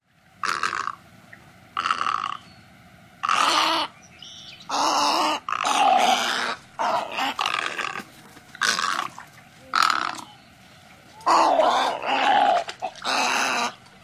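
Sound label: SNOEGR gagging croaks, snowy egret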